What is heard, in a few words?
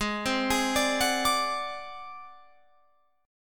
A flat 7th Flat 5th A flat Guitar Open F, chord; 20,19,20,21,21,21; arpeggiated